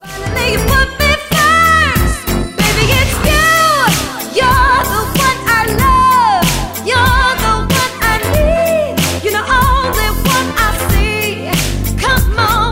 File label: Corporate Party Cover Band